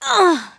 HIT; DARKJEDIF; Star Wars Knights Of The Old Republic I; XBOX

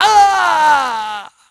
death; Pulse Racer

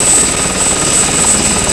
copter; WhiteOut

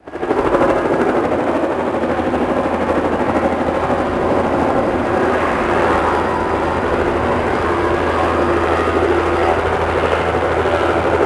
helicopter landing outside; Max Payne; manor

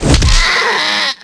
hit goat; animal; Tony Hawk's Project 8; XBOX

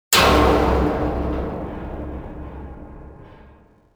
metal thump, cargoliner; Soldier Of Fortune II Double Helix; XBOX; ambience

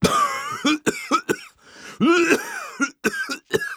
walk

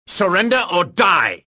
anger

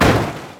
whl down LGT; Cars; XBOX

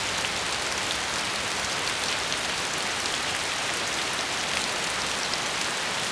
rain; Splashdown; XBOX; Soultree

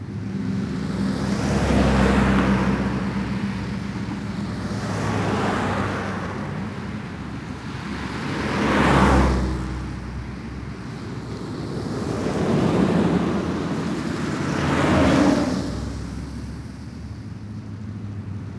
Cars Passing medium, street; ambient

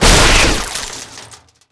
bipod

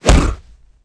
headbutt; Tony Hawk's Project 8; XBOX

